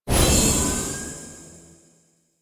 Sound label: Glyph Tower Activate; Glyph Puzzle; Warframe; Duviri